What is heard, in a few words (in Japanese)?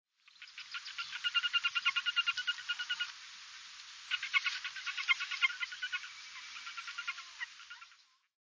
akaeri; アカエリカイツブリ; 北海道サロベツ